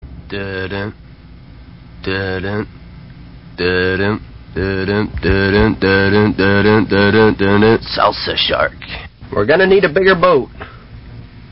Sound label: Salsa